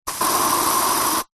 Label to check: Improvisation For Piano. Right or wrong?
Wrong